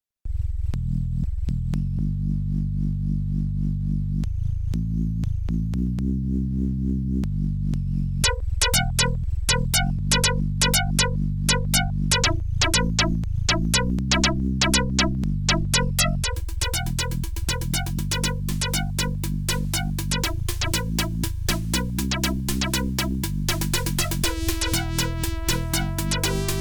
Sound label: OPL2